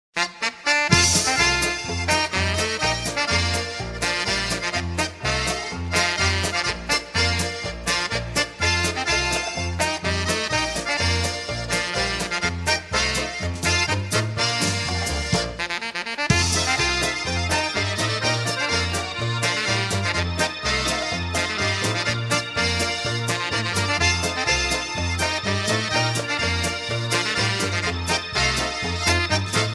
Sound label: Polka